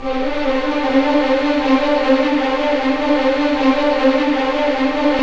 RIVER; East West; Scoring Tools; ORC; River Flow

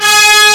HORN ENSM, Fairlight CMI, BRASS

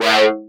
Breakdown FX, Underground UK House & Electro

